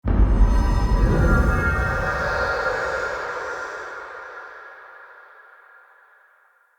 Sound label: Secret Uncovered Hint; Puzzles